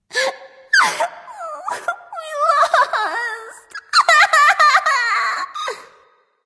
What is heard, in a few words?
boo hoo; smite; voicepack